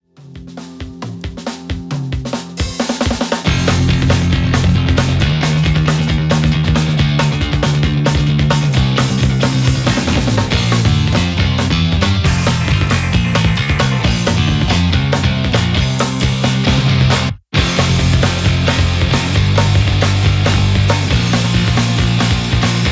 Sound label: Pick; Alusonic Custom Shop Django Deluxe 4 NT GN